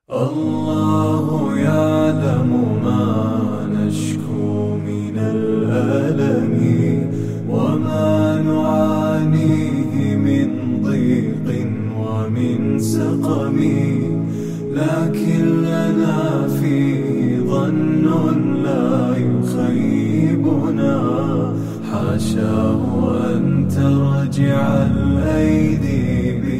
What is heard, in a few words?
Allahu aalam, Vocals Only, nasheed